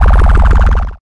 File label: sound design bubbling synth low mid; zapsplat